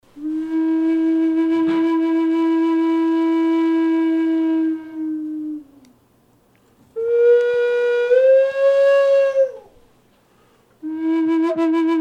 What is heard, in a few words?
SONG FLUTE